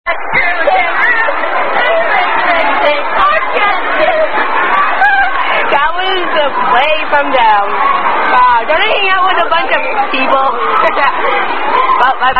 Fuck, Fuck, Fuck A Duck (Tune Row Row Row Your Boat)